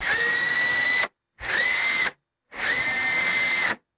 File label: small robotic servo motor whirring